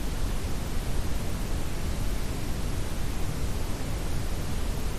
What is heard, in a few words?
noise